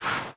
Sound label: shoot